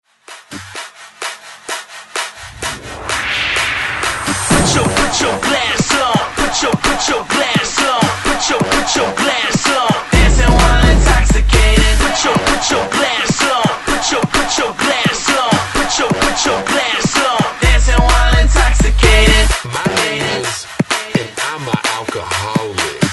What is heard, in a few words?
Remix Hype 128 Bpm; DJDOLLS; Unreleased Remix Hype Clean